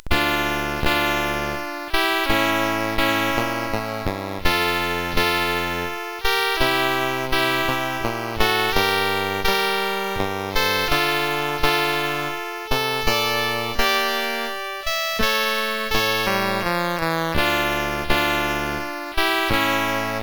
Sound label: Sarabande, sid CSG8580R5